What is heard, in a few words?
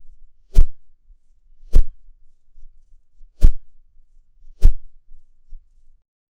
cartoon golf swing and ba